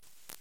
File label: underwater life